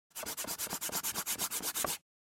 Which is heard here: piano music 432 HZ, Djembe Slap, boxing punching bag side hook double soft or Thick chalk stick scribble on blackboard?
Thick chalk stick scribble on blackboard